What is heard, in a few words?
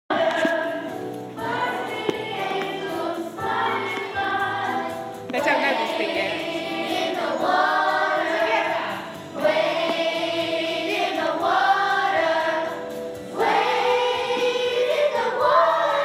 choir practice